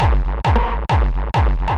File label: Tech loop